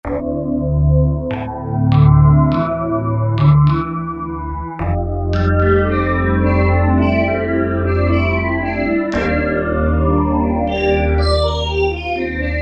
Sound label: Korg T3 Wavesweep